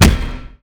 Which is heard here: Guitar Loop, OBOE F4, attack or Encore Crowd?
attack